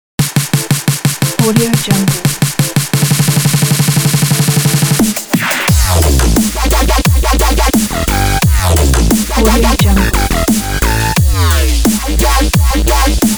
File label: dubstep